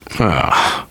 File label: negative noise, survivor voice, biker